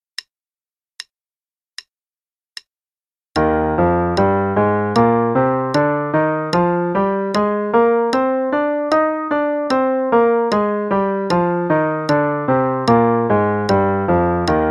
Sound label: Eb Major Scale only (qn=76); 8th notes = 2 octaves, and triplets = 3 octaves; CM Level 4; Daily Warm-ups